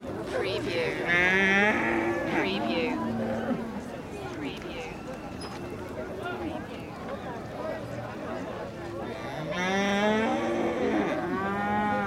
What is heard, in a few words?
animal market